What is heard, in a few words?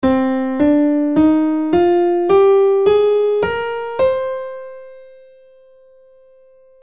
Scale of C Minor Natural